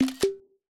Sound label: Screen Capture; iOS 26 Sounds